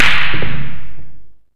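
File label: Block hit; LOTUS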